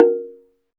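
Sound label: SLIT DRUM A#4 P; SLIT DRM1; Heart of Africa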